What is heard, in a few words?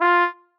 marimba trombone; ゆめ2っき